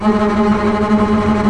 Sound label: TREM STRINGS